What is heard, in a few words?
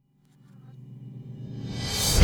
Combo Shard, Processed Drumkits; Sonic Foundry (Sony Creative Software) - Processed Drumkits Zero Gravity Beats; Shards